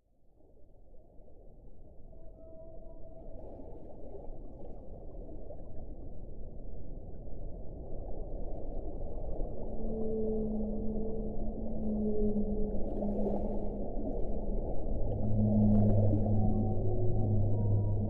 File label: whale mix; ambient